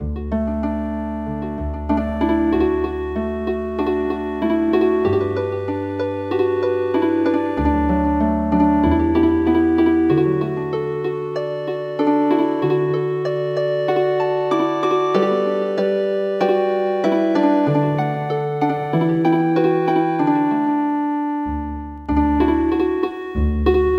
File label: Healin Harp